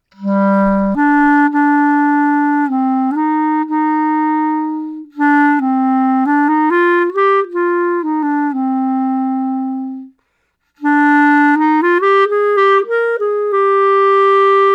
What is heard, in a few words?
CLARINET TUBE